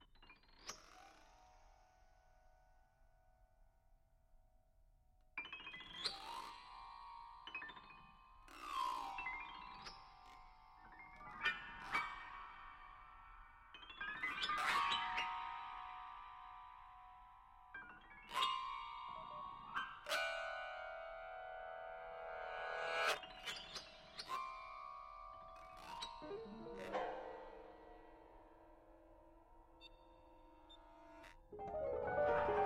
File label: etudes for piano and electronics; three etudes